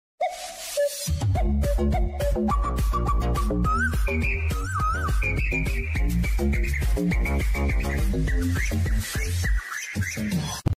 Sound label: seagull; GMOD; meme sound effect; animals